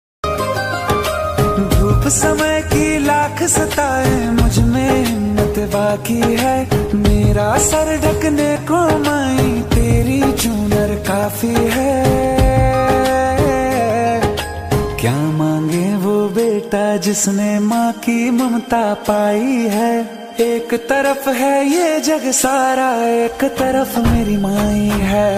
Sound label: bhakti ringtone navratri ringtone